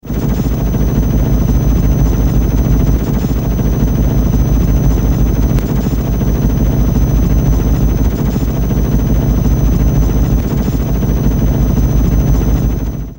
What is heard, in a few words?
Internal Hum; Airwolf